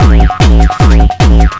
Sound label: 150 bpm hardcore jawharp rave; 141 to 160 bpm